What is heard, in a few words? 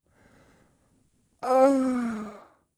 groan; Singles Flirt Up Your Life; Pacifica